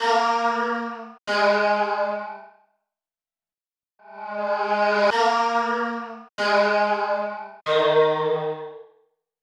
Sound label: Vox Pad 94 Am